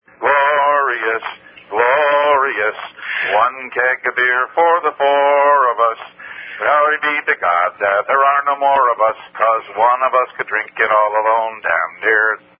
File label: Chorus Only